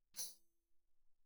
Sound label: tambourine normal pp; Percussion